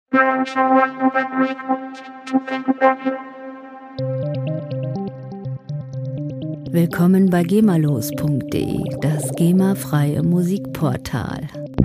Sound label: tucan vocoder